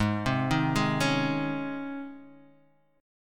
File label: C sharp Major 7th Suspended 2nd G sharp